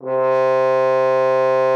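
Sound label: French horn C3; Xsample VOL-3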